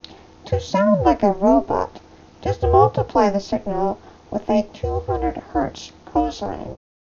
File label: Robot